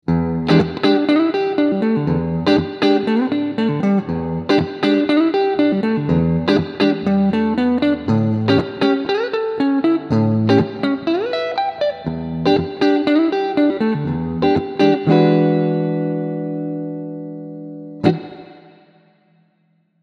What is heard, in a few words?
ESP Phoenix 401 Neck clean